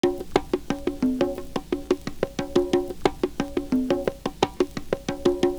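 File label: percussion, loop; Univers Sons - Kitch 70s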